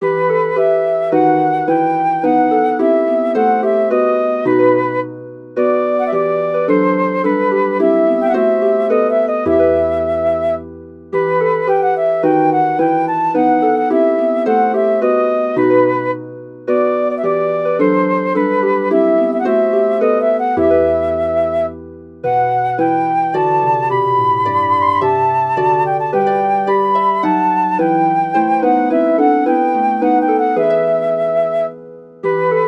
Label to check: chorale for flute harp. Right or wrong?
Right